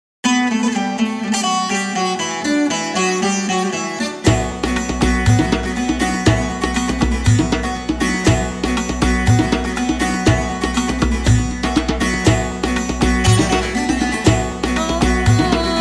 ethno world turkish vibe

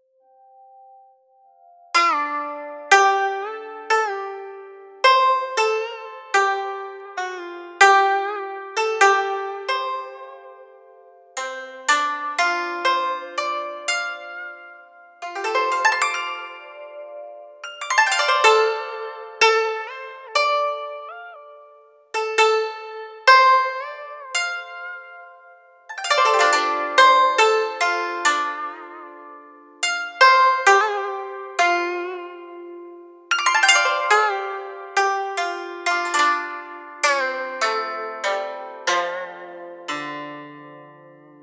dan tranh

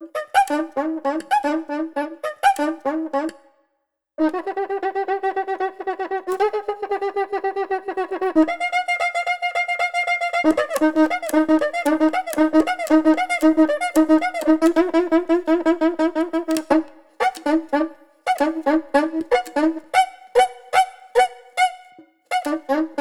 cuca rhythmic loops

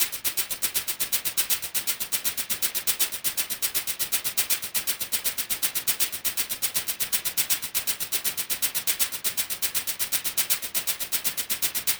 metallic loop 160 bpm

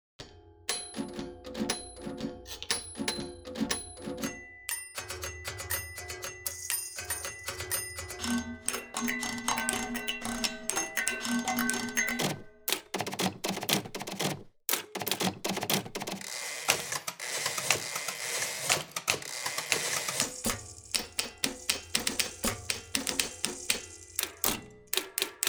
all 5 typewriters

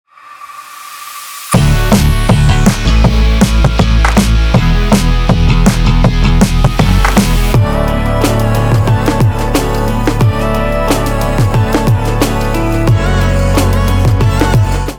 diamond pop

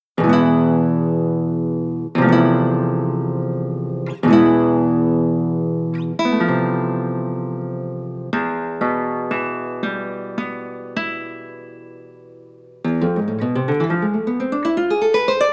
acoustic guitar

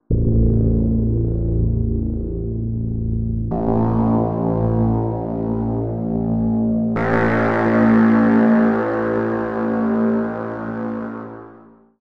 atmo warning zone

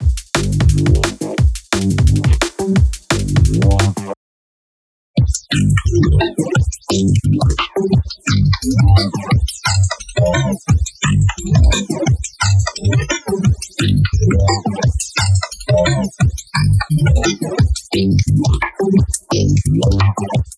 dnb

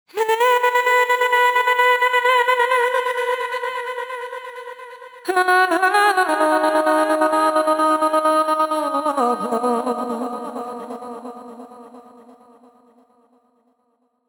gated vocals

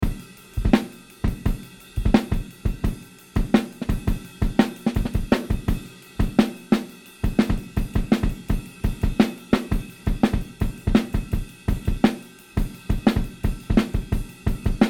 tape breaks

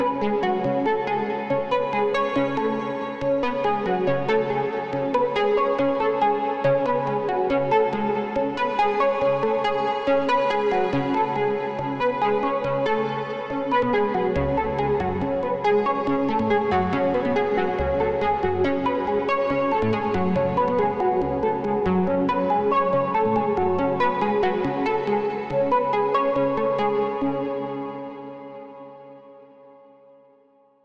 melodic enchantment

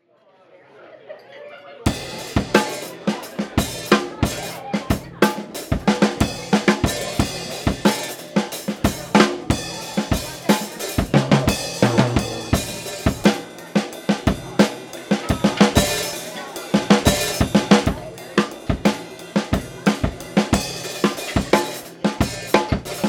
1960s drums

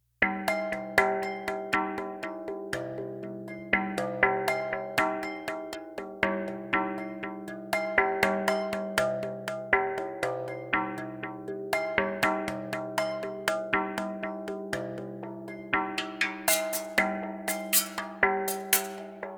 filtered slates

